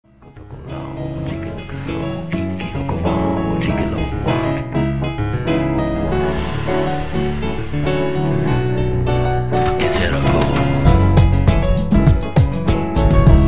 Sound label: groovy and funky cabana